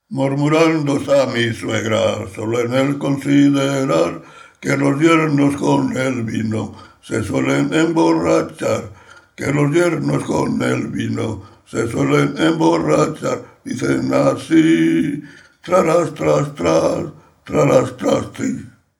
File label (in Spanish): Murmurando tarareo